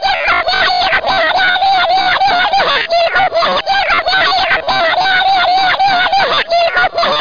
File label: angrys